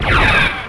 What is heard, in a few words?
Disruptor, Weapons